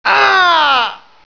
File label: die; payned